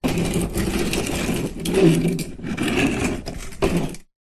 household electric lawn mower roll movement on tiled garage floor; zapsplat